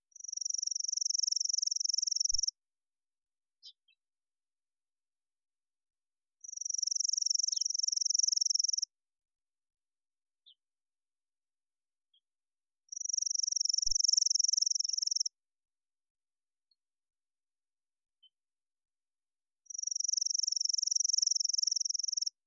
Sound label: Chipping Sparrow